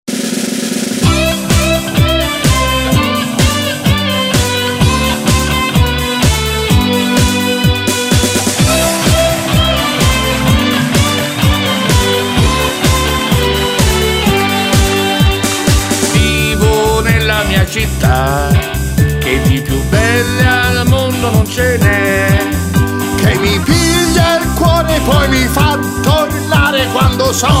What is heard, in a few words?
viola; firenze